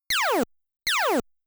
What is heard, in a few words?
Fighter -Shot; Galaga